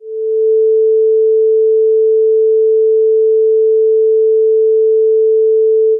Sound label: sine 440Hz